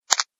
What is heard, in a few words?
Lock